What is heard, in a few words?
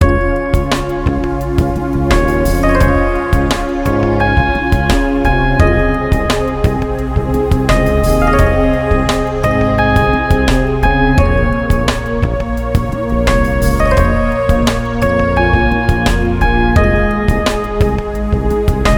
travel dreams, melodyloops